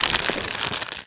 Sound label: zombie move, foley; Half Life Model Pack